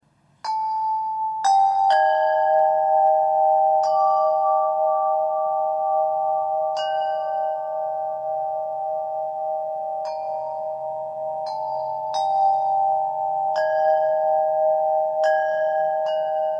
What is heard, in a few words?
Winter, windchime